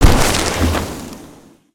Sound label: Snowman Smash; destructibles